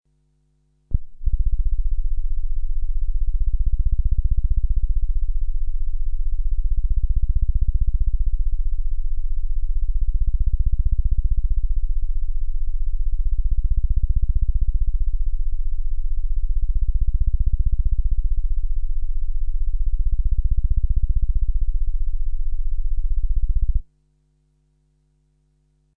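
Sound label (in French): infragrave nappes statiques, Attention départ sec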